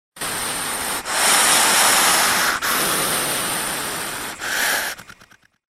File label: the sound of a hedgehog hissing when angry